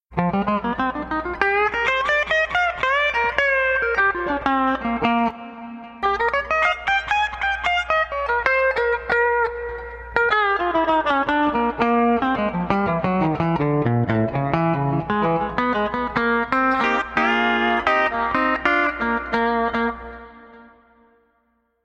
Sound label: Blues Run, pedal steel